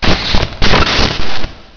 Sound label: boss discharge